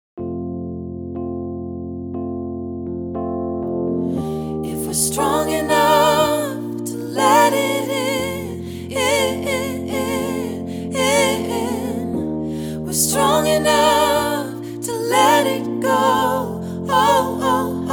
Alto 1 practice track

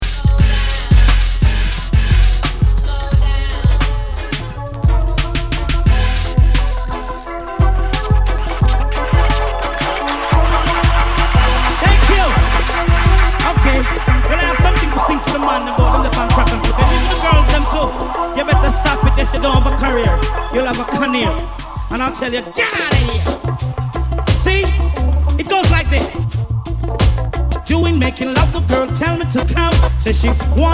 Riddim

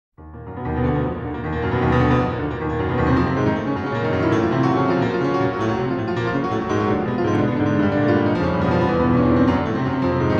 Prelude e flat minor

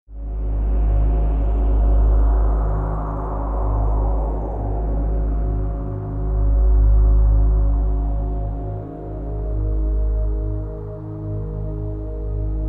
flotation music wind rises

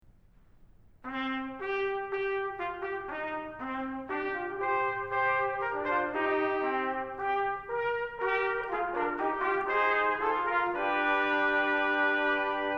Fanfarria, Moderato maestoso

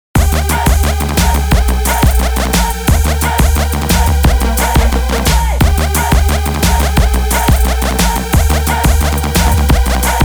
TRAP LOVE

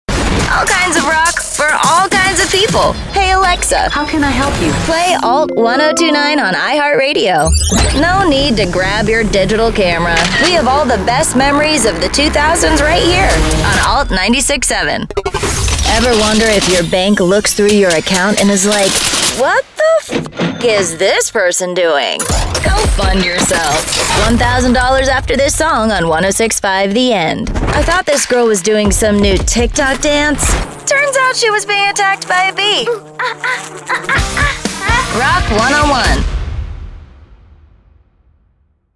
Rock

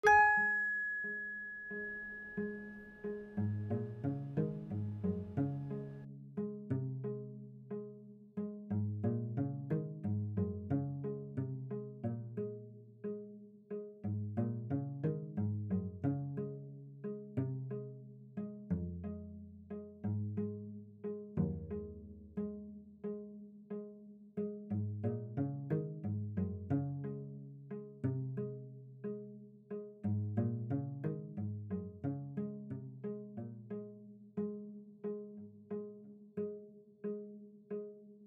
Email Underscore

